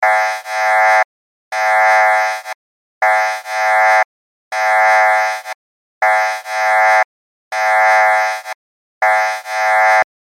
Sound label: sound earthquake maneuver